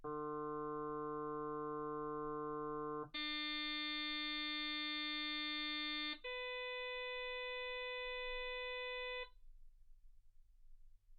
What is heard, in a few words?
harm sinus 48kHz